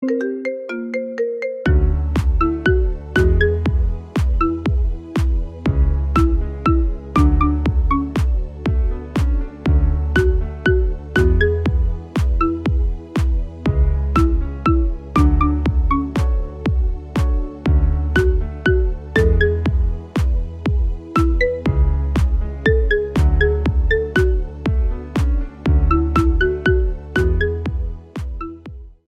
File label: Marimba Remix